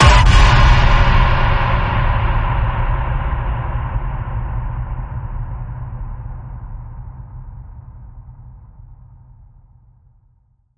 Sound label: Ping, B; Radioactivity Ping